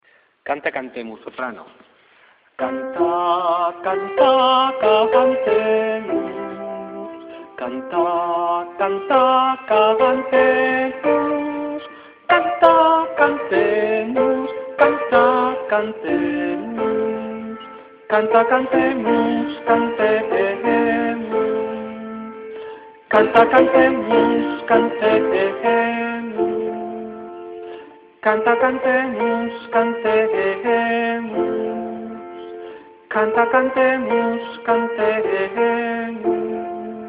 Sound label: soprano